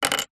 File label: office pencil small down on wood surface